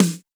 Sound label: subtle reverb snare drum f key; snare drum samples